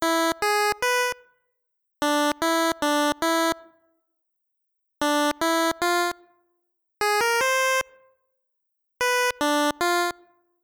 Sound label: Jingle Medley; Tetris DX; GB